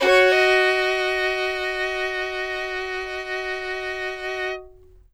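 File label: fiddle hammer B4; Sampleheads; Whole Lotta Country 1 & 2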